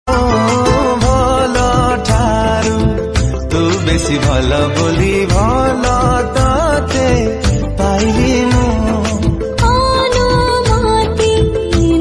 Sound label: Odia Song